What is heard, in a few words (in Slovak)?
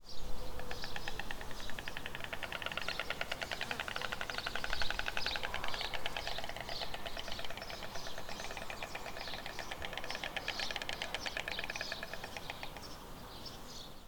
Čečejovce bociany; cecejovce kostolna